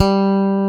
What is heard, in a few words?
tite bass G4; Drums In Your FACE!